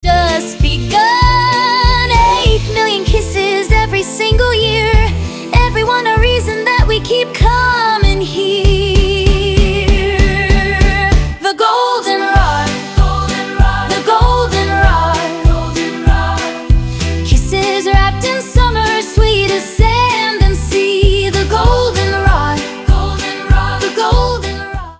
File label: ringtone; the goldenrod